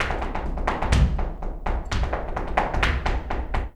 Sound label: Flamenco, ZAPATEADOSOL